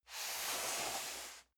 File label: cardboard box medium slide soft; objekte